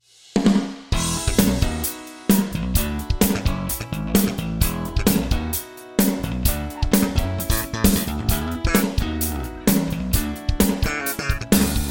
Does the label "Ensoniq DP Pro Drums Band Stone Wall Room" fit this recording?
Yes